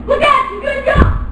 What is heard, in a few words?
jump; Fishing Flash Cards